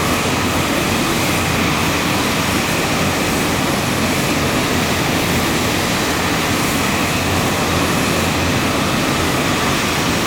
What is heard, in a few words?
Aircrafts Engines F86 Around Engine; DCS